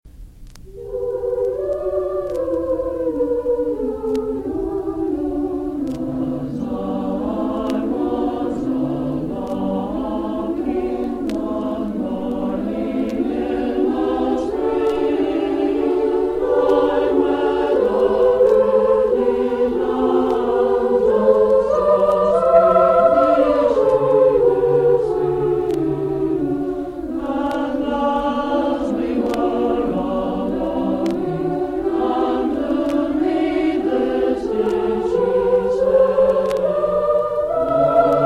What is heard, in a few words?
A Choral Concert by the A Capella Choir